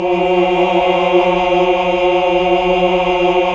HAL AAH. AAAH Uni

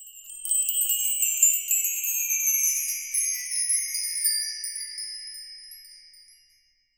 ALUMINU, TREE BELLS; Voice Crystal - Steve Reid Definitive Percussion

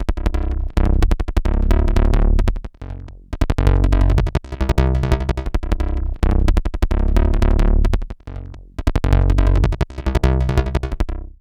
BASS, BASSLINES 175; Masterbits; Tekkno Trax

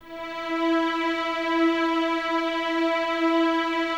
violins E 4, String Ensembles, 23 Violins; Miroslav Vitous

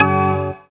SYN, dance; Sound & Vision - Gigapack II

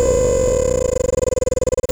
glitch thing

